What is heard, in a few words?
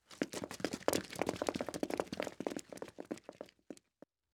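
Footsteps, Group, Running on Concrete; Fairlight Sound Lib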